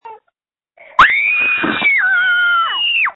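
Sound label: Scream, phone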